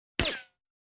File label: Silencer Shot